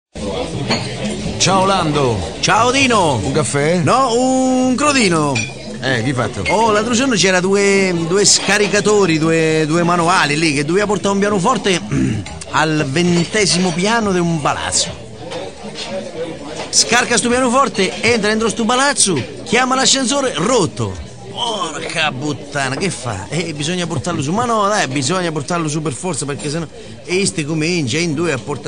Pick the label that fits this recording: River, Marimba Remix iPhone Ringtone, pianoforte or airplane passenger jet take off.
pianoforte